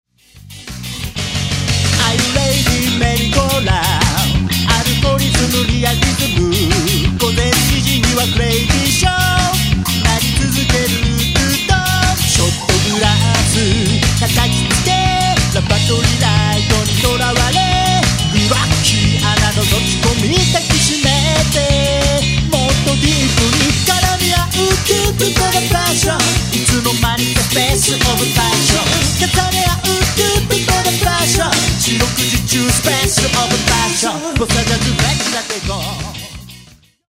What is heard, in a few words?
two tone sample